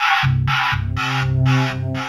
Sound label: MACHINES; AMG - Vince Clarke Lucky Bastard